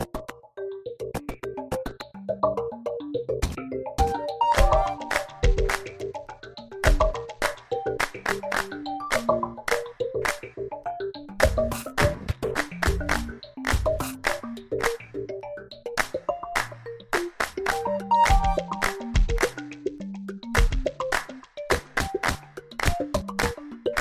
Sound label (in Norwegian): musikk